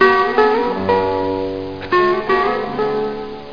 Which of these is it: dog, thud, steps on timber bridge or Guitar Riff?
Guitar Riff